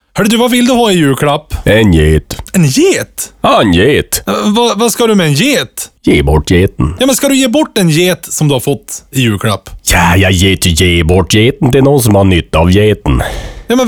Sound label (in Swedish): Reklamjingeln; HOPPETS GET